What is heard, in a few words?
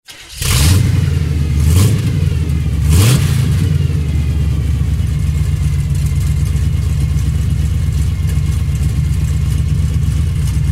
Vehicle